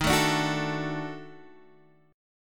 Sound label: Eb Minor Major 7th Flat 5th, Guitar DADGAD